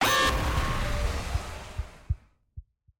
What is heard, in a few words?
warning; pyke